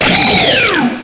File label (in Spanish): Grito de Genesect